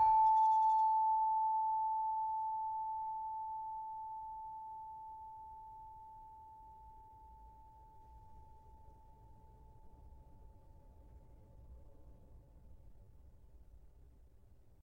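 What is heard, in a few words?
Saron A5, gravity harps tuned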